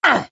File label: hurt light; cj